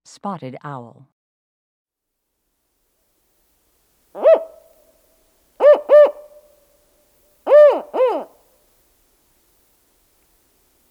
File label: Spotted Owl